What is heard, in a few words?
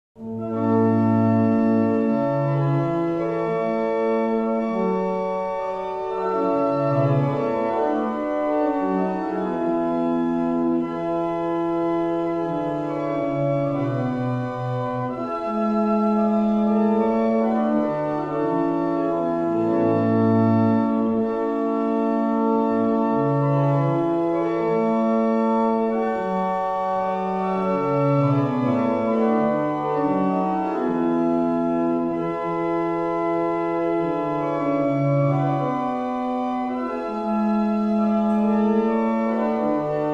Double chant, barrel organ; Avington; Soaper